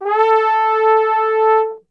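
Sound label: A 2 trombone glissando, Brass Super Section; Best Service; TRMB GLISS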